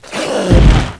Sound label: camel atk